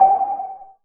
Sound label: noise fx; Masterbits; Switch FX